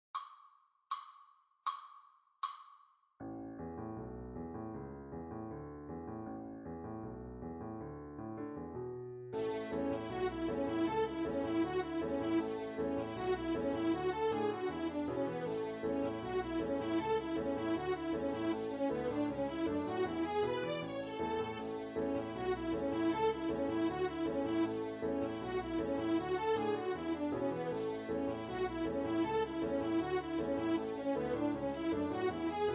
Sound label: 80bpm